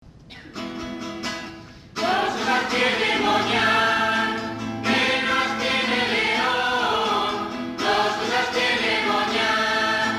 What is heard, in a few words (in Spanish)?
Coro